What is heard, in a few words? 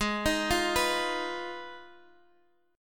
F Minor 7th Flat 5th, A flat